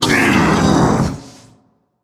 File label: ouch; smite; geb